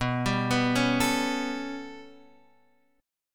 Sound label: B 7th Suspended 2nd Sharp 5th down, chord; B7sus2#5 arpeggiated; JGuitar